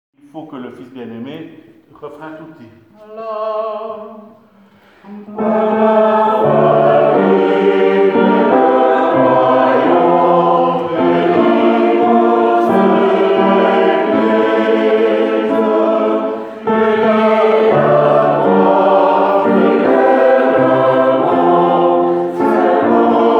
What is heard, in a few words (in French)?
Il faut que le fils refrain tutti